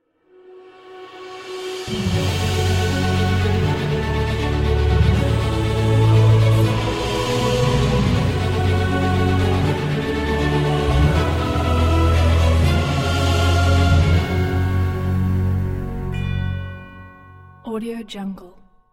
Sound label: epic uplifting intro and outtro